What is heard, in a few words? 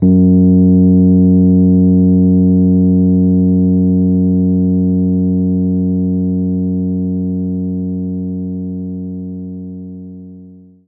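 Rhodes F#1; knights try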